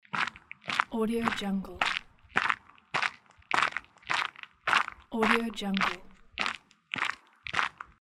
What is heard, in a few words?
footsteps on gravel